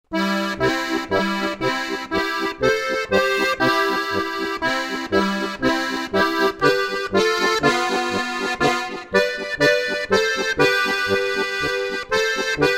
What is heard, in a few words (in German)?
Polka